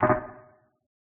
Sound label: foul, pool